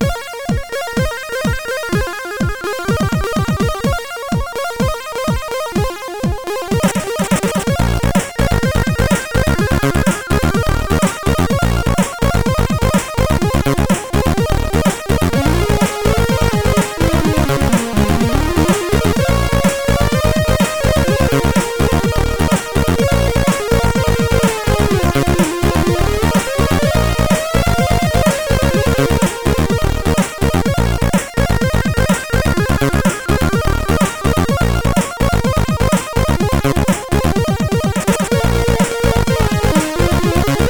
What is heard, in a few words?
CSG8580; Electrixy